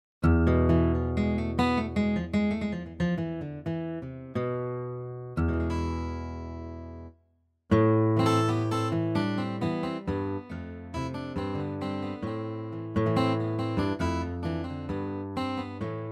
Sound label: Guitar